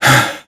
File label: breathing better